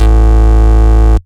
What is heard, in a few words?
Bass Root A